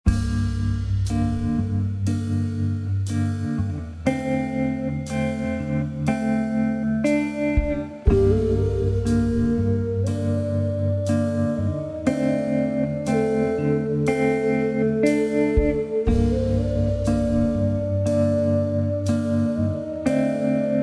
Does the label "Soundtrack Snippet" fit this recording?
Yes